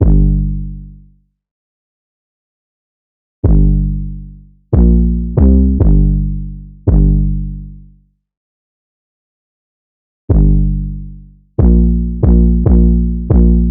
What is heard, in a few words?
808 loop 140 Gm; Slate Digital BASSHOLE Sample Pack